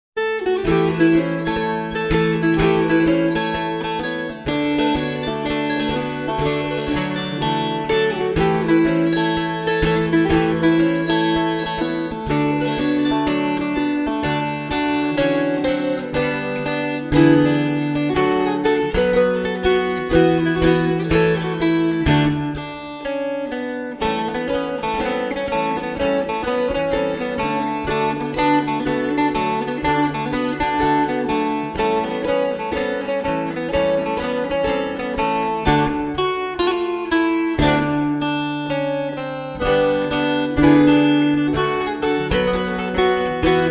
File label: Rag fast